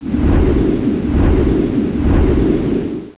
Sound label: WIND GEN